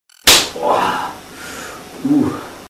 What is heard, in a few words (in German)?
ohrfeige links oh uh